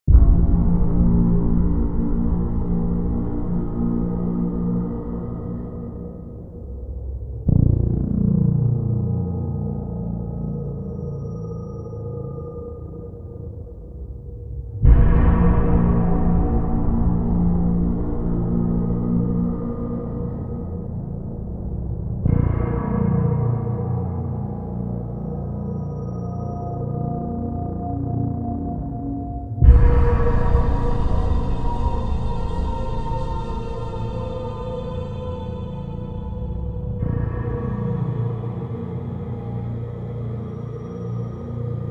trailer rebel sandstorm epic build up hybrid horror music